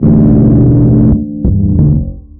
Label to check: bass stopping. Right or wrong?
Right